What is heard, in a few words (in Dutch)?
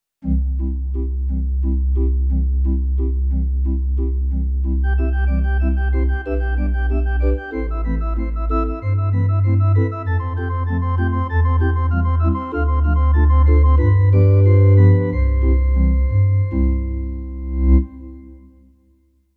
Kerkorgel